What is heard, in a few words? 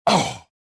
choke; reborn